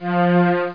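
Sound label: Slow Strings; Prince of Persia 2 Demo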